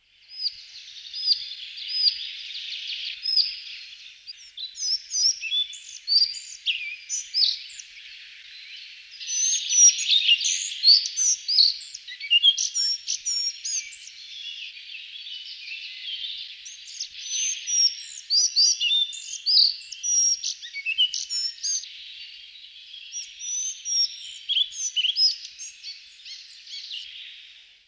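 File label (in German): halsbandschnäpper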